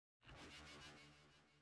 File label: open Portal; ludumdare32